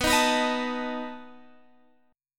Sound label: B 7th B down Guitar Open G